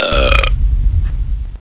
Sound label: burp